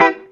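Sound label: guitar chord E minor, roots reggae; Sounds Good - Roots Reggae; GTR CHD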